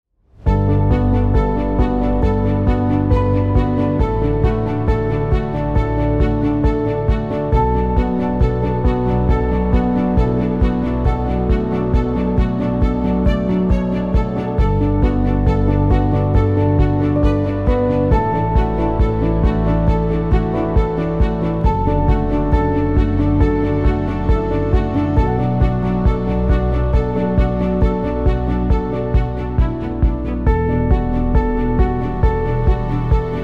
Movements dynamic

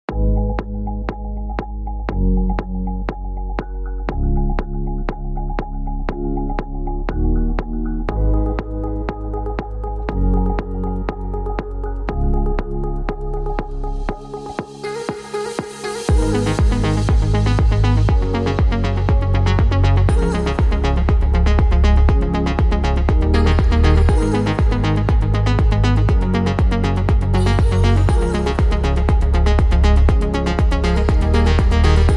Savanna Soul reduced; mediterran

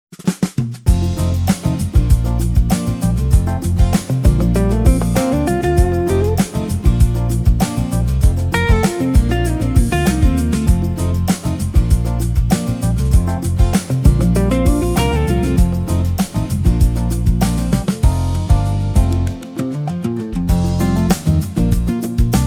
Groove in the Greenwood dynamic, folk

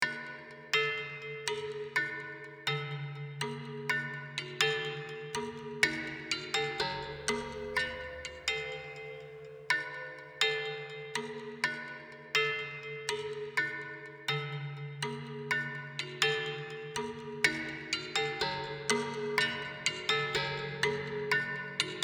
reduced percussion; reportage

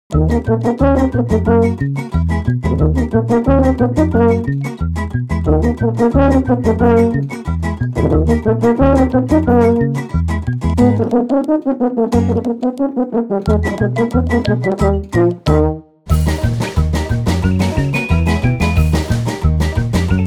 Polka on Espresso reduced

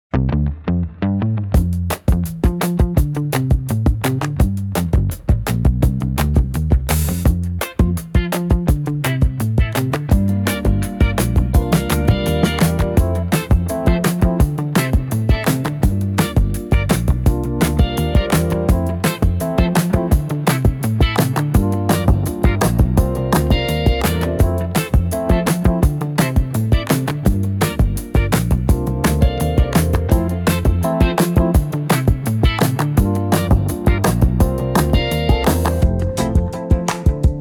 Groove Candy easy; opener

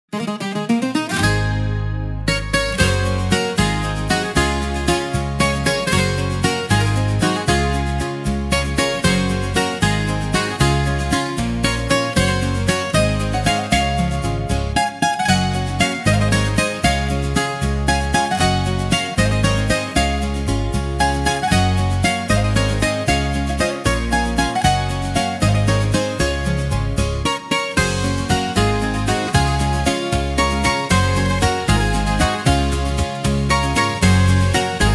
Family Party at the Tavern, percussion

